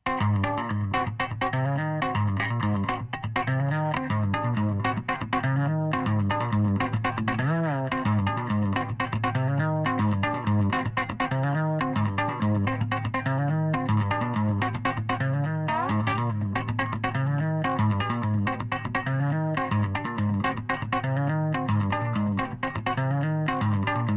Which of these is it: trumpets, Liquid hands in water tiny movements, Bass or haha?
Bass